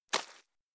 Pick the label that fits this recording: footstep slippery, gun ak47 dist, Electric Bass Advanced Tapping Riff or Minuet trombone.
footstep slippery